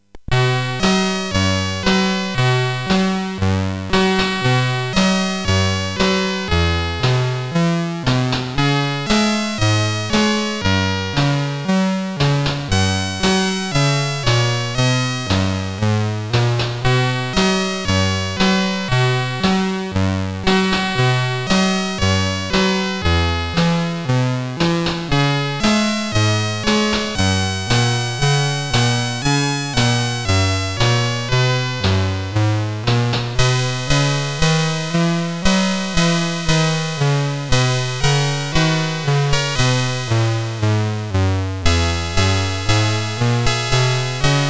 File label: Jingle Bells, sid CSG8580R5